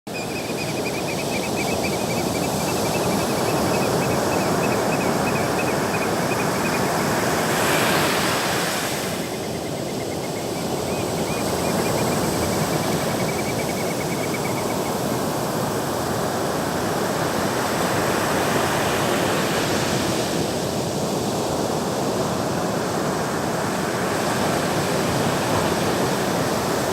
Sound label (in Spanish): sonido de las olas del mar corto